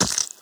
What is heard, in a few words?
squish